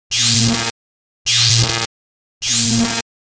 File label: Electric spark; Knova